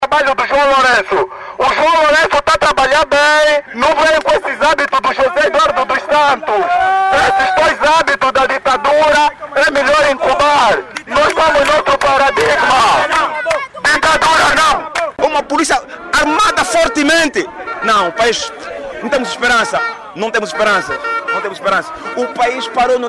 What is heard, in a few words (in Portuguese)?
manifestacao dos activistas